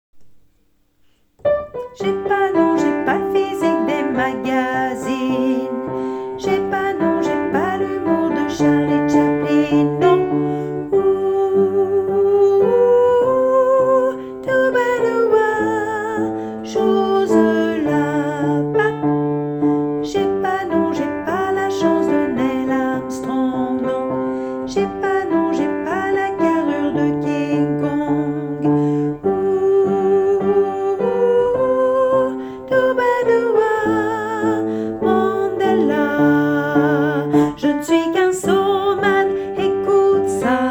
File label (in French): basse